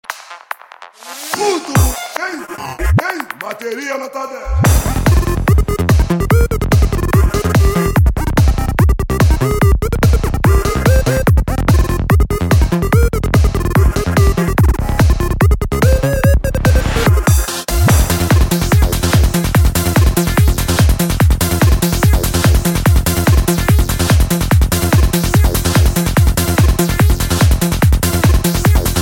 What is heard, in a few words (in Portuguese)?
batucada